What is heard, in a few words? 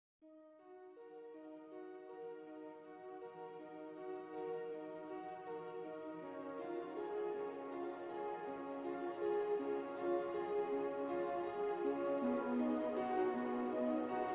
Simple Synth Only